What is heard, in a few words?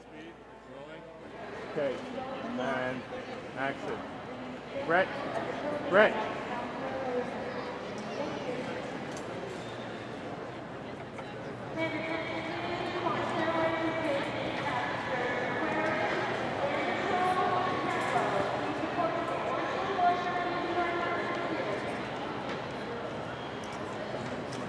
BG Airport; Poetry Project